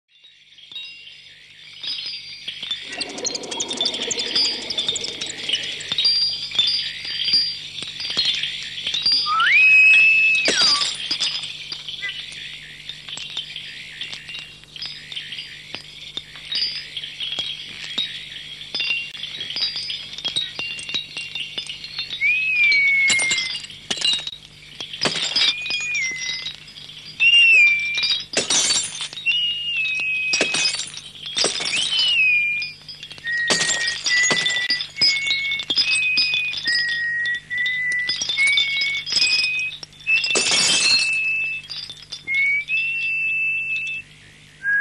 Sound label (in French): siffle; la planete sauvage